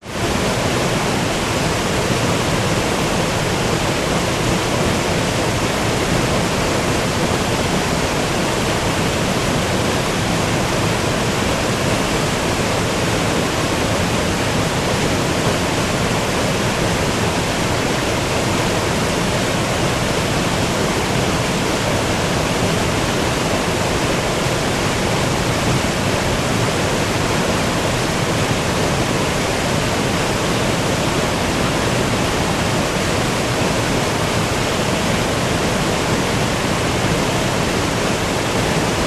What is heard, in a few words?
waterfall Germany; Allqaeu